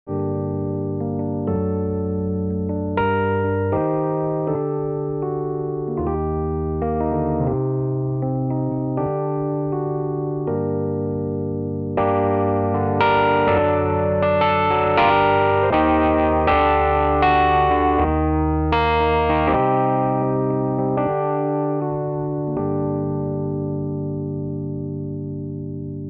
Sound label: Rhodes V8; Chicks Delight